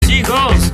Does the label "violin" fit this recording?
No